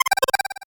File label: 8bit se